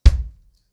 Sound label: Kick, drums singles; The Roots Drumloops